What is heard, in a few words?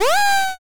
pico surprise